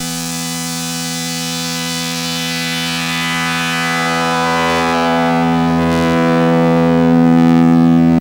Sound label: SID lead E; ChipShop Deluxe; ChipShop Hits